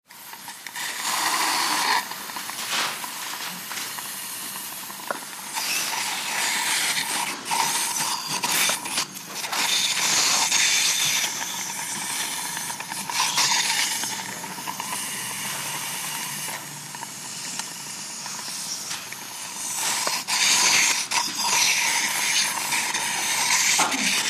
HOSPITAL EQUIPMENT HOSPITAL: Operating room, suction on wound.